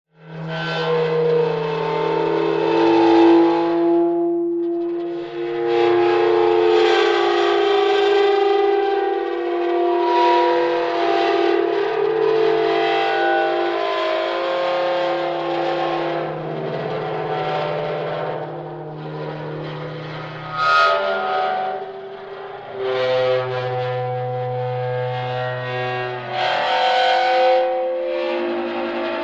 Dry Ice Eerie Metall

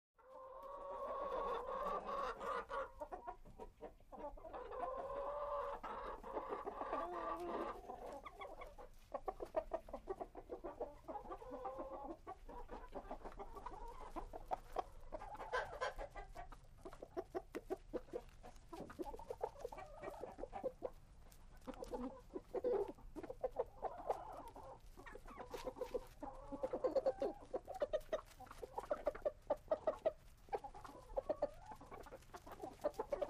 Several Chickens

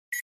Flash Beep Elect Puls

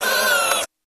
Door Outer Open, Damper, Wavering

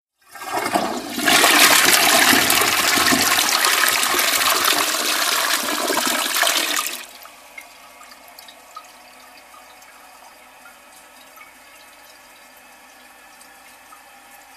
Toilet Flush, toilet

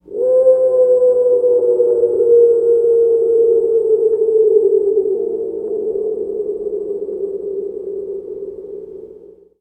Hawk Low Yell

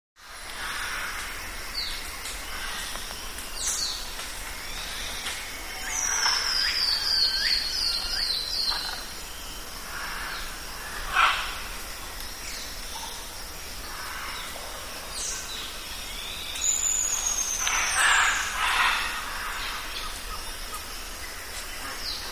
Jungle South America, rainforest; Jungle Atmosphere; South American Rain Forest Atmosphere. Quiet Birds.

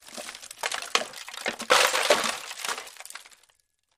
Junk Debris

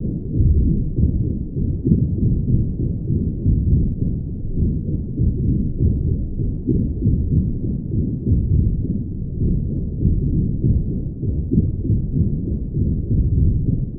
Propeller Movement Under

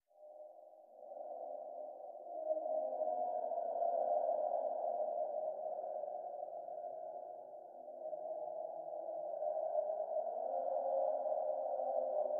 Underwater Amb Spook, ambience

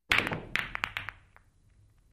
Billiard Ball Bankin

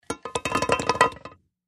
Pouring potatoes into an empty pot.

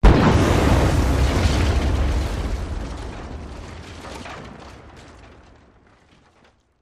Explosion; Wood Debris; wood & sand

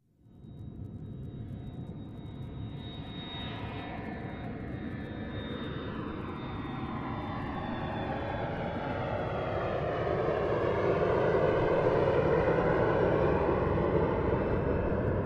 Plane By Overhead

